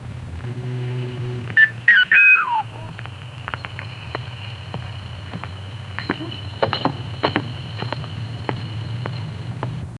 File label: old rotary telephone ring; outdoor